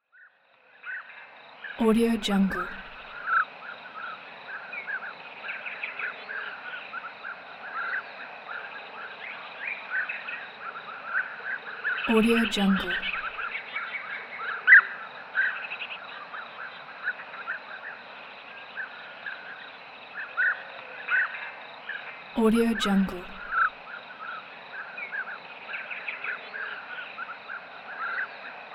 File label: birds in forest